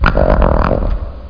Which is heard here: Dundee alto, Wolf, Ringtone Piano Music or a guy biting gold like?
Wolf